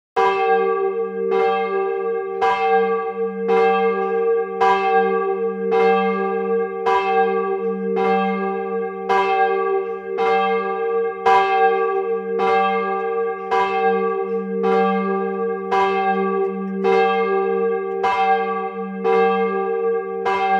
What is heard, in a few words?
bells church; best service Blue Box